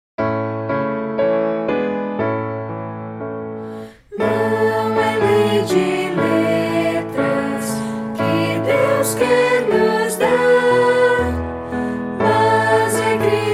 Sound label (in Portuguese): voz piano